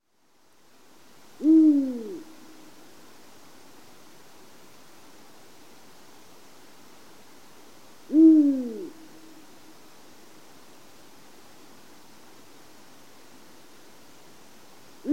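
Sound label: Eurasian eagle-owl, dohoku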